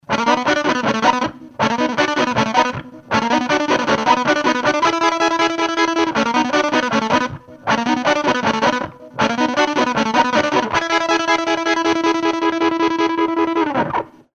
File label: Tap Four, toggle